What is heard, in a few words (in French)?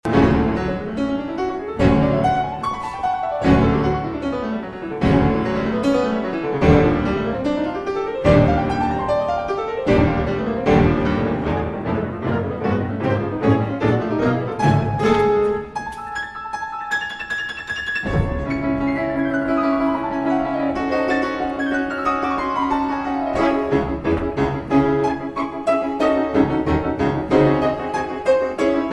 concerto pour 2 pianos; POULENC